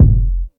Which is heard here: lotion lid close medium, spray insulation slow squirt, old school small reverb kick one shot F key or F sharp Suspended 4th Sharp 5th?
old school small reverb kick one shot F key